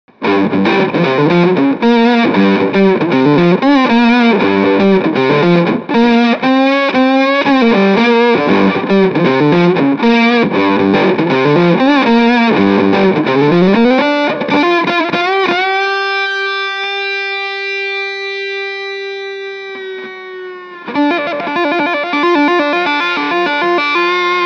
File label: Octave Medium Fuzz